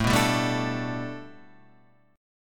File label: A 7th Suspended 2nd Suspended 4th; x,x,7,4,7,4,4,6